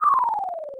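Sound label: coconut thrown by monkey; sonic the hedgehog 3 knuckles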